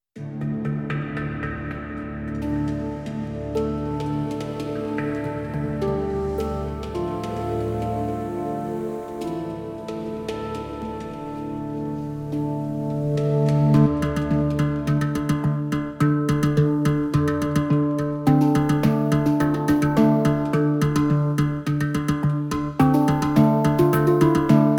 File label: Real Handpan